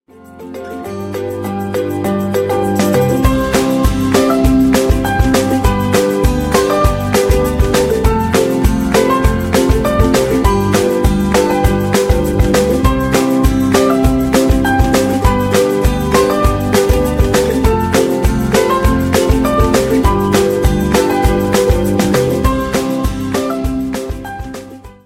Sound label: music